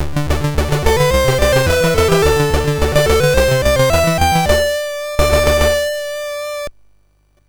sid MOS6581R3, GAMES; Continental Circus

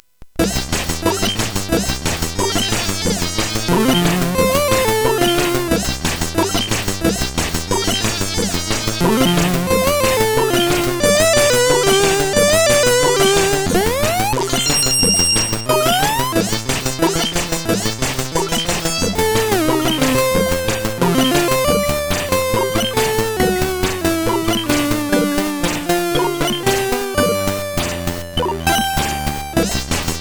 Go Music, SID CSG 8580R5

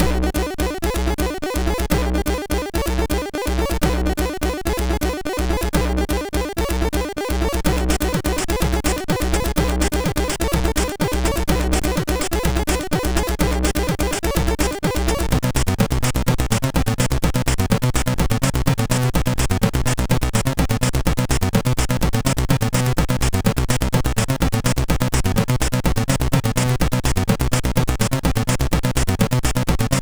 techno mix, MOS6581R3; Major Techno Mix

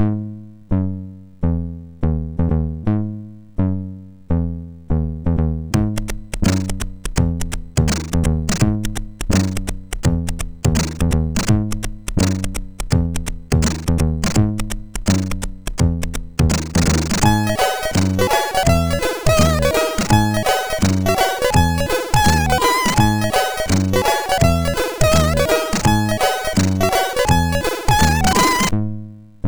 MOS6581; MOS6581R4

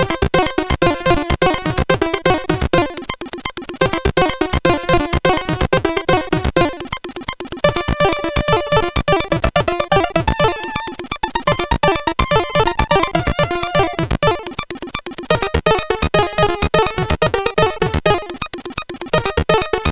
Samba, sid CSG8580R5; hvsc

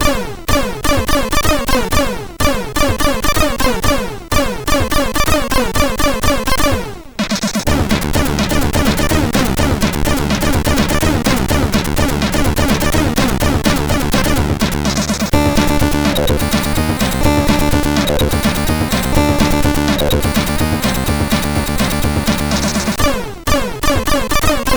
Easy Techno, MOS 6581; MOS6581R3; hvsc

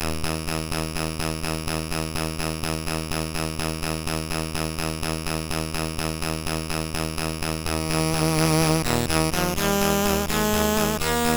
Intro, MOS6581R4

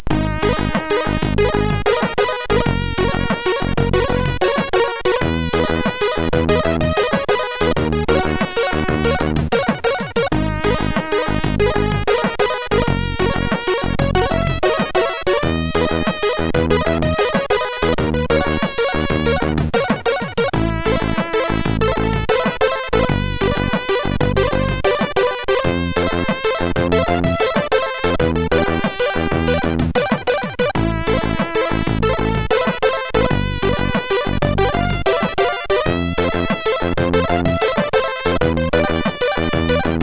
Intro sid MOS6581R4; hvsc

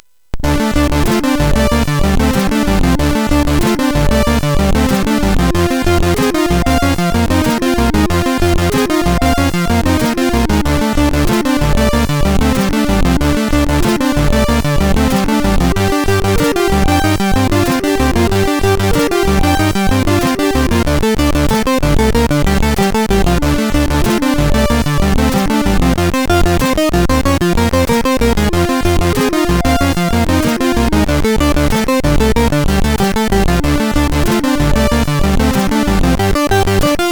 sid MOS6581R4, GAMES; Dalek Attack

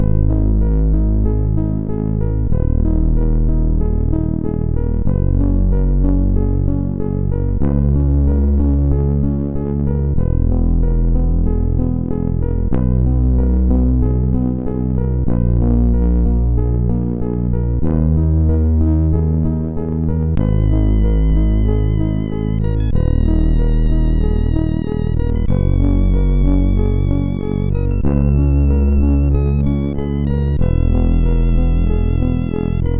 sid, MOS6581R4; Winding-Sheet

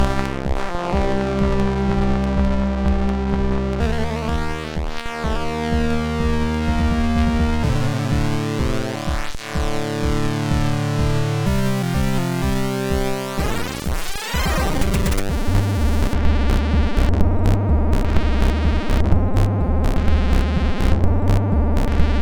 Jazzy Ocean, MOS6581R3

